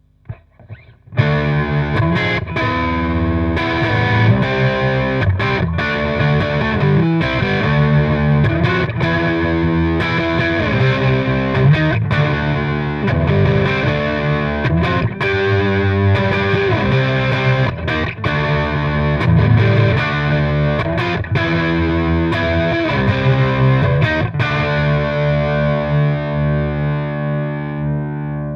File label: Brit 800